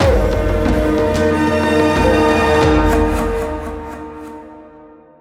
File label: DRAGON Victory; song of iron